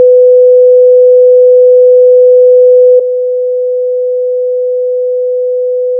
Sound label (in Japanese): 500Hzの音データ/基準音と低減音 [会話音[男性の声]など]; ペアガラス対応